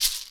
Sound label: box rattle; Kenya Box Rattle